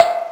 perc, Yamaha DD-8

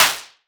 Cwejman S1 Small Clap

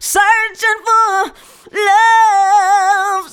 SEARCHIN FOR, FEMALE VOICE; Best Service; Hallelujah

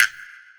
EQ Perc, Percussion Mix

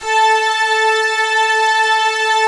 ORG STRN

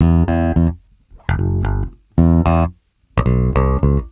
Bass Loop, Ambient Grooves

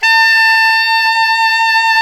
SAX ALTO MP; Alto Tube

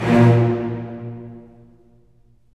STR Full Short; Roland - LA Composer vol. 4

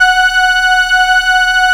SIEL, String Machines

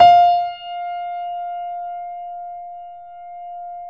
F 4, Keyboards Acoustic, GRANDP ST; USB Soundscan vol.17

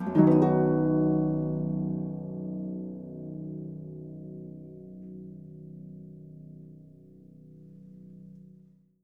Percussion & Harp; Miroslav Vitous

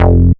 bass classic F; M8; Polyend; ARC Noise

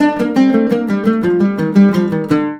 LUTH C, Arabian Traditions, LUTH RIFF; USB Soundscan vol.43; AKAI

